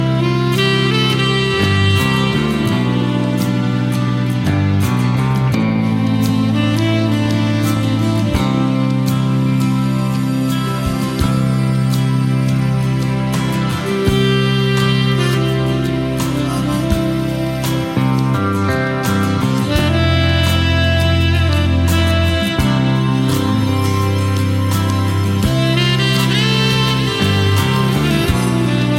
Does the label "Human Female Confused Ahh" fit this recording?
No